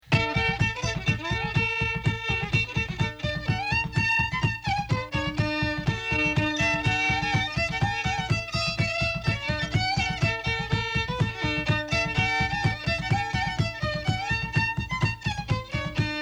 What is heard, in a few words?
March